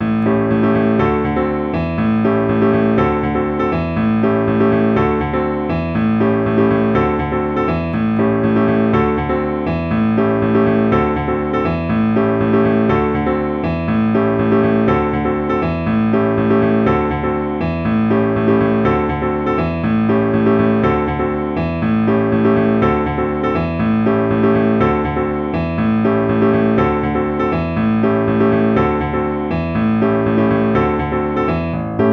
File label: Gospel Rhythm Exercise